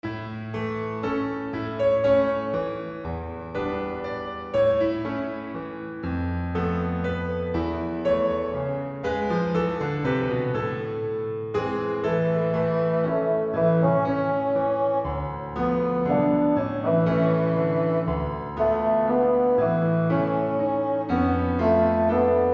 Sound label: A Stroll in the Country Bassoon, Piano